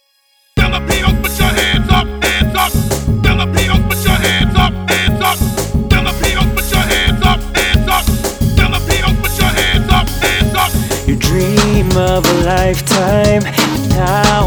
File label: corporate jingle remix